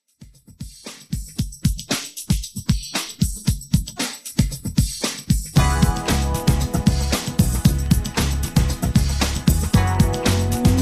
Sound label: 80s Extended, 115 Bpm